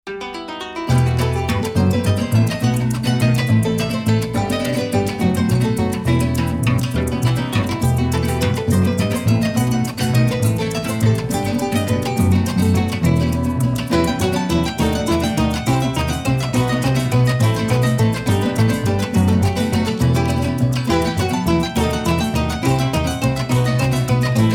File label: Music Vehicle Radio; Jurassic World Evolution